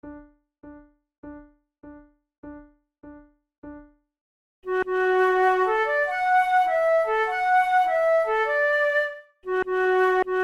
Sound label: duet